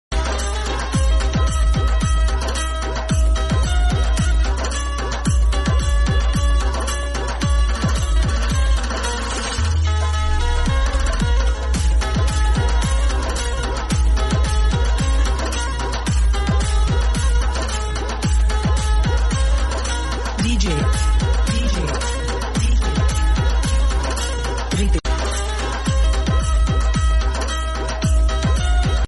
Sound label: Banjo, Marathi Song; Sai Baba; Devotional; Ringtones